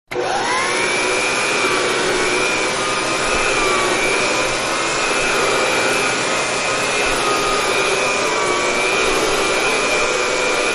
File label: Vacuuming A Rug, Vacuum Cleaner; Cleaning; Offices; Manhattan Production Music